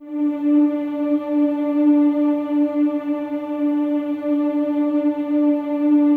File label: D4, CHOIR; Spectrasonics; Symphony of Voices